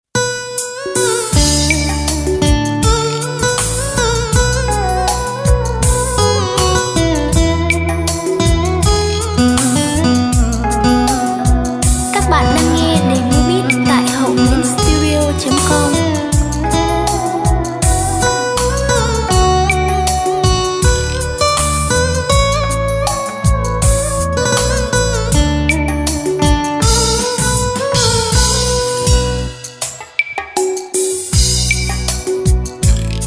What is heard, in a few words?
Lk beat, playback